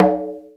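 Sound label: GOAT CONGA, AFRICAN PERCUSSION; GOATCNGA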